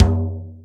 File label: rock tom; Greytsounds; Scott Peers Sound Engineering